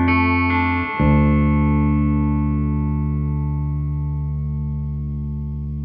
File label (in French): guitare